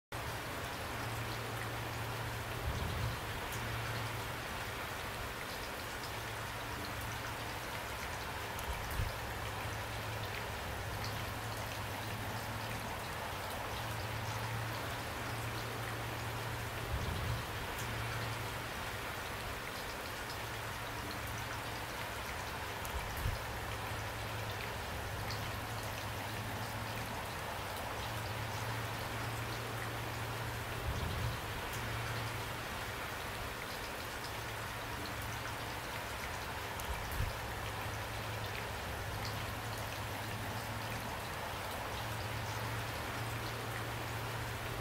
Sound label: rain sound